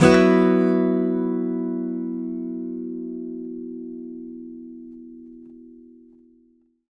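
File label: acoustic guitar B sus down, Whole Lotta Country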